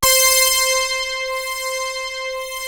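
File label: MOOG BASS C2; USB Soundscan vol.49; Analog Fantasies; AKAI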